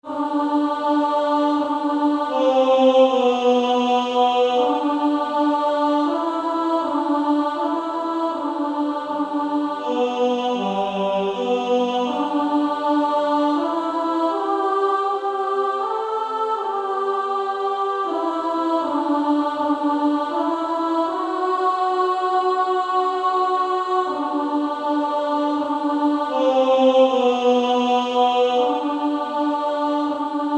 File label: Female Choir A1; SSAA 4-part; 1st Alto; Abide with Me